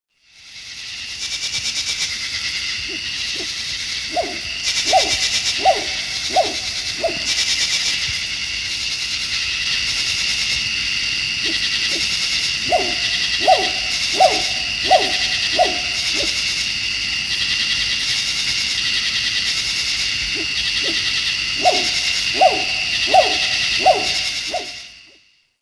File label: moow; The Ultimate Birder